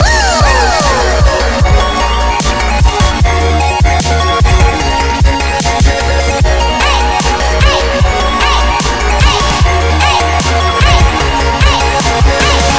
kawaii chill

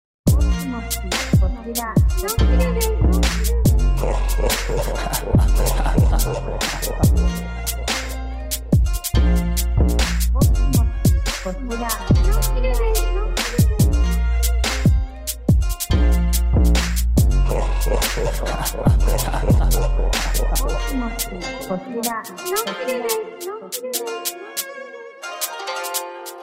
Drill; Shiwasu Drill; Shinogikill Beats

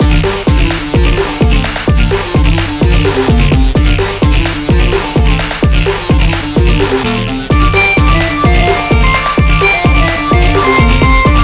Celtic Dance Music

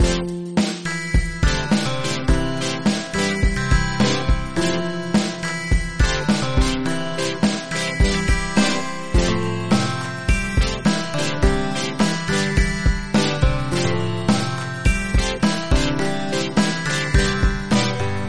emo

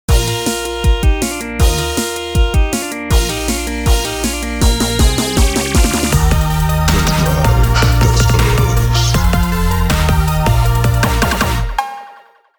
Johnny Be 808

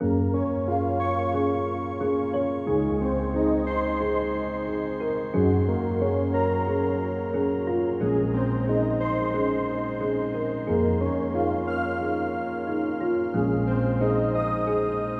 Melody メロディ; Newyork demo ver

